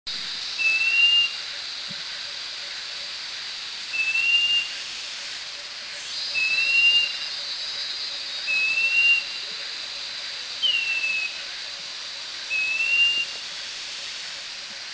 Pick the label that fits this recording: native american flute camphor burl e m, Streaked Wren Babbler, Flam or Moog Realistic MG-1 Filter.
Streaked Wren Babbler